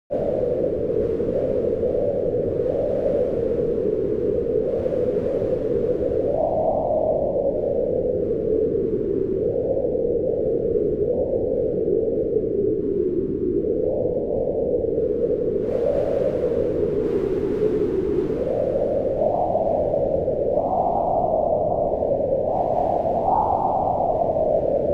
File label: wind in the top of the mountain; mixkit